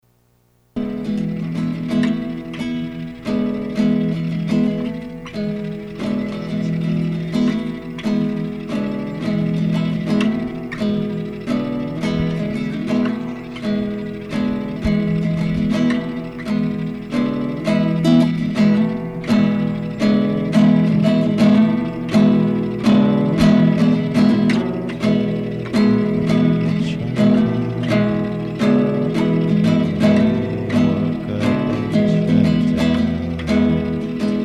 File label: guitar